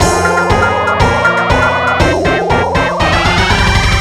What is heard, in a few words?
speed up; tf2ware ultimate; music game; ds diy microgame